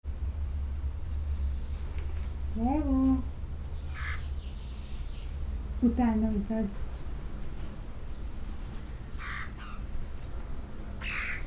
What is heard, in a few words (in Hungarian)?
beszél a jákó, jákó papagáj; szegedi zoo 2011